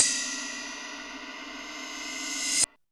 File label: REV RIDE; Back In Time Records; Big Bang; ST.REV.HH+CY